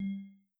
Windows Default; GMM Windows 10

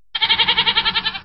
goat; Indev+ 0.3 Portable

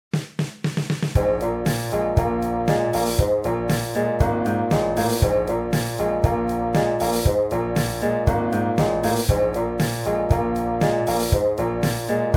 Classic Blues

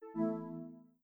Speech Off, Windows 10; GMM Windows 10

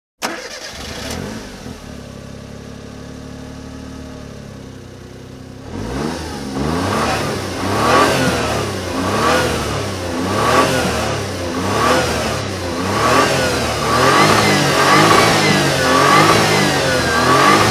Ignition Exhaust Sound; Initial D 5th Stage Sound File; Initial D Music Lossless Collection

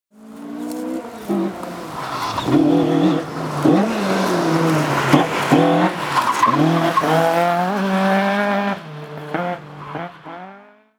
Driving Sound; Initial D Final Best Collection

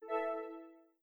Speech On, Windows 10; GMM Windows 10

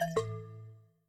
Windows Hardware Remove; Pearl

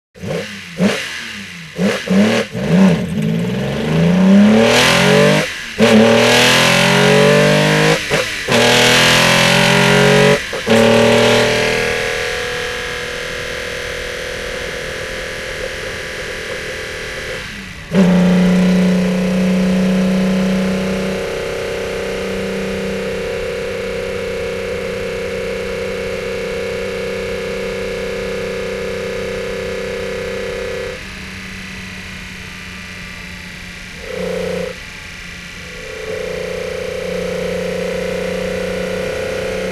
Boost Sound; Initial D 5th Stage Sound File; Initial D Music Lossless Collection

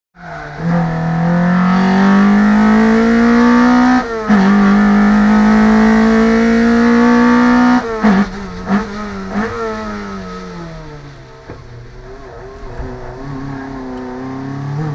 Boost Sound; Initial D 5th Stage Sound File; Initial D Music Lossless Collection

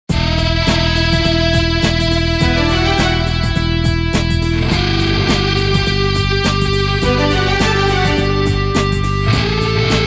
suspense; Initial D Second Stage Sound Files

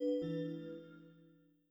Windows Logoff; GMM Windows 10

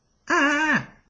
ostrich hurt; Indev+ 0.3 Portable

